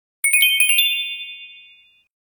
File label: Message, Nokia tones digital; N78